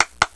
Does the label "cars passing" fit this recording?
No